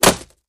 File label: Arrow Hits Arrow Hit